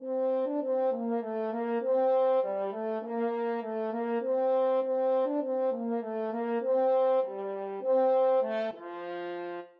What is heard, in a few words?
London Bridge, french horn